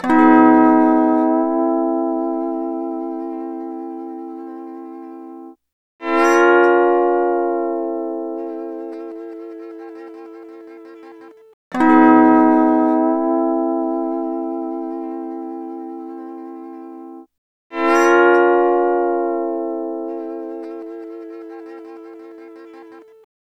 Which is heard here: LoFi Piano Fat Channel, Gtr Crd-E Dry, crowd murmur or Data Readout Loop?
Gtr Crd-E Dry